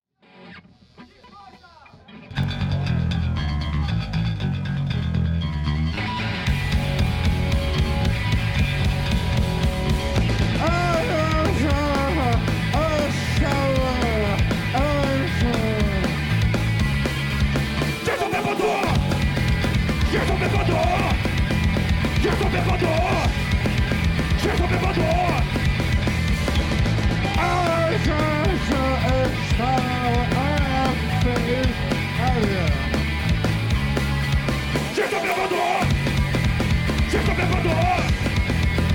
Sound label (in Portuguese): ao vivo no pub; st paul s pub